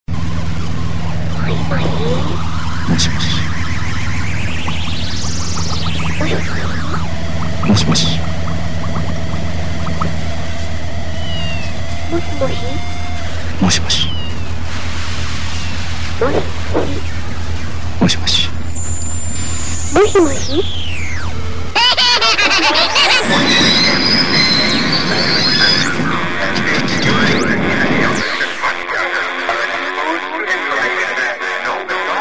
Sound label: breaknoisecore